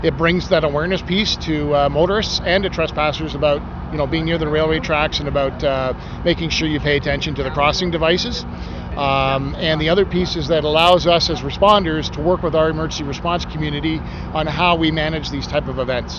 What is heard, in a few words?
mock crash